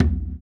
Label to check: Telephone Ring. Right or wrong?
Wrong